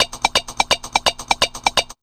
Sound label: 127 DRM, Fresh Disco House; USB Soundscan vol.20; AKAI